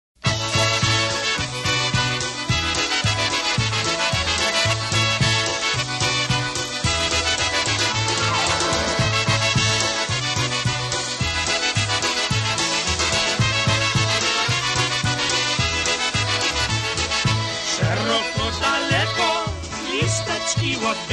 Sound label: Polka